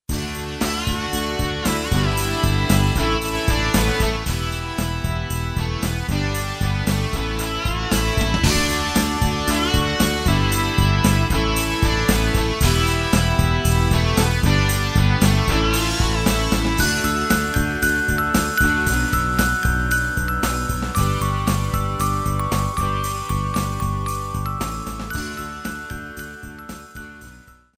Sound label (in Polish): wersja instrumentlana